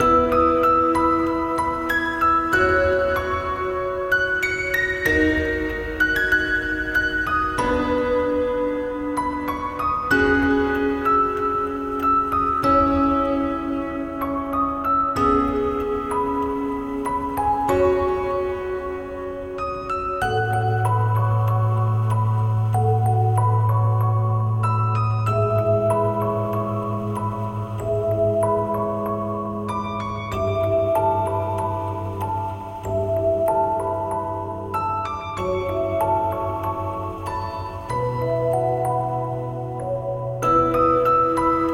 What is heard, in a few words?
Final Boss Phase 3 Piano